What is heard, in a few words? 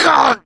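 death scream, male; survivor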